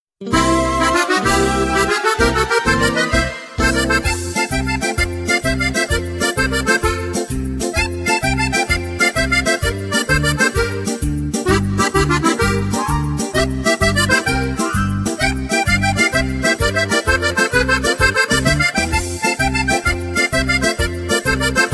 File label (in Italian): tarantella fisa; atuttafisa